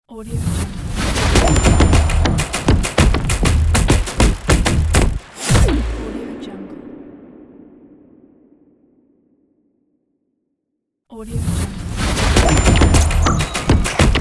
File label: stomp logo; volkovsound